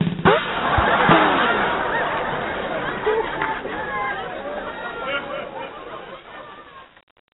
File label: Jingle Joke; Neighbours from Hell; NDS